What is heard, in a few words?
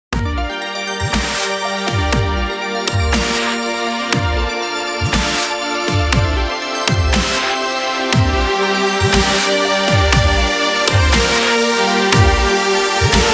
Orchestral Dubstep